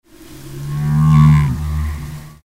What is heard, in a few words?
humpback, sounds of the sea